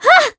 Throw Harp; Zelda throwing her harp to Link; Skyward Sword

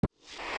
push crawl bridge; zelda; OOT

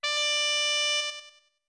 Notes Pipes D2 long

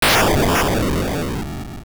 Boss Explode; The final explosion of a boss

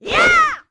Sword Spin Stereo; The Wind Waker

